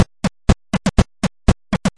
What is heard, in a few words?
120 8 bit shuffle, drum loops and breaks